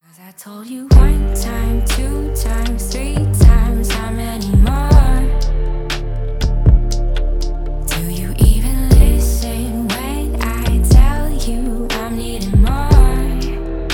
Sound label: HIP HOP